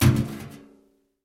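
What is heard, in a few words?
sounds of canister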